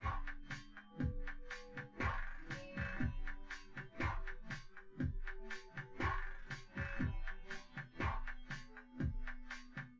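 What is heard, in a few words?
with drums synth conv 5cm sim; SASSEC07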